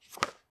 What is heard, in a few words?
close book, page flip noises